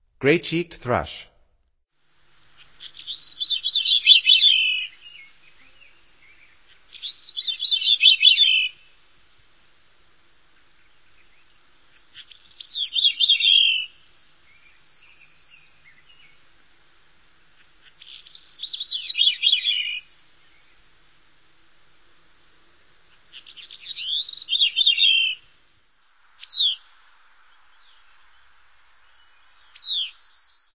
Gray-cheeked Thrush, Songs of Birds of North America; Stokes